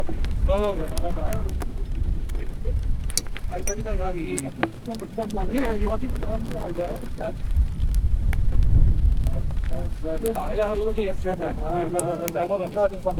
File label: vikings working on ships, marine